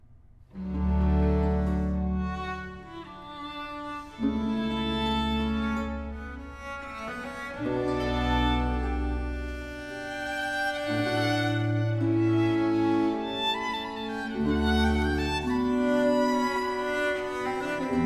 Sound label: Concerto in G minor Adagio, organo; Concerto no. 3; HWV 291; Haendel; Concerti per organo